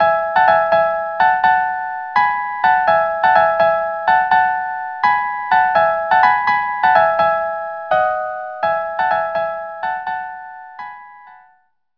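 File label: violin, violin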